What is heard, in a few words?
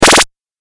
8 Bit Style Laser Pulse; Video Game